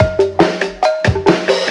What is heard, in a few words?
rings and whistles, funky drum loop; Drum loops